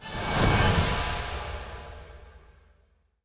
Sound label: High Priest Amet Attack